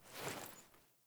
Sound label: Foley Action Default Block Remove, Foley Default Clothing; Characters; Player